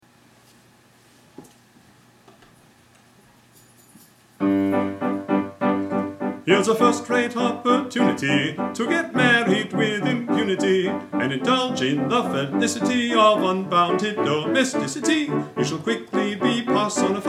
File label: bass; Pirates Penzance Sudbury